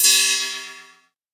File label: basic wizard double bolt